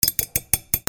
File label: KITCHEN